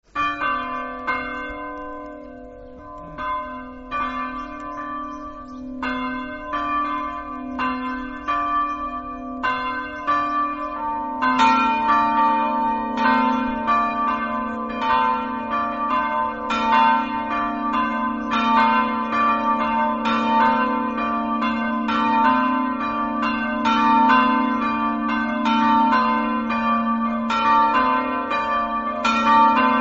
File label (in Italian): campane